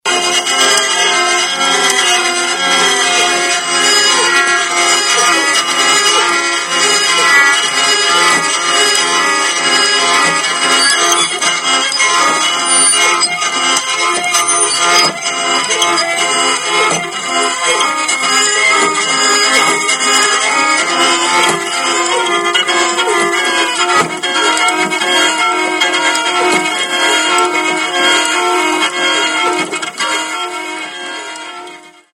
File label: 3 neck 2 piston wheeling violin